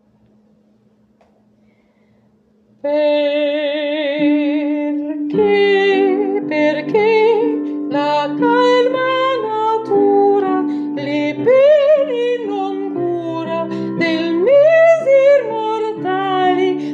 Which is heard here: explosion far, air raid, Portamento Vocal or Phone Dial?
Portamento Vocal